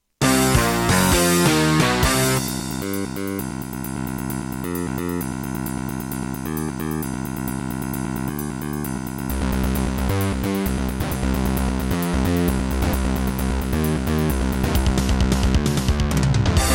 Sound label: Roland SD-50 GS MODE; sigil doom episode 5